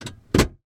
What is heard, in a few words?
van seat distance from pedals adjust iveco; transportation; sound effects; sound effects one